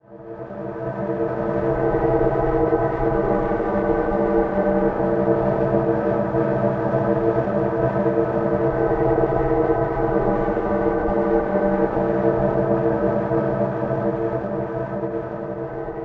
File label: Tomb Drone C; Zero-G Seismic Frequencies; Ancient Drones; 90 s Sample CDs